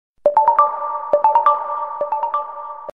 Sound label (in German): Beste orientalische SMS